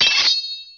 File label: swords